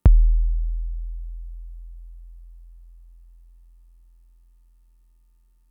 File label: Kick, Drum Machines Fricke MFB-522